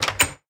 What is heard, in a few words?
door open, minecraft